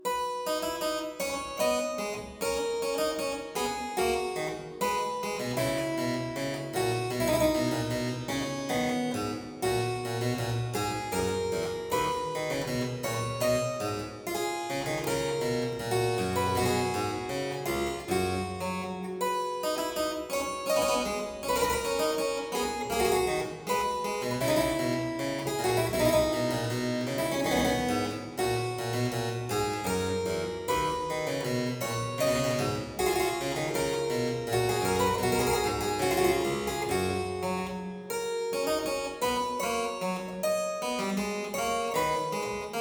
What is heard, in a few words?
Aria in C major Variatio 4, Harpsichord; Buxtehude; Complete Harpsichord Music